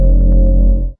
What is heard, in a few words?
particle buzz; Sfx; freepixel; Tilter